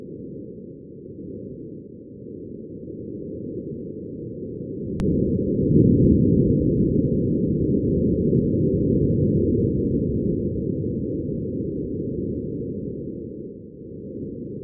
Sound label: Sounds of Mars wind on mars; Wind an der Landestelle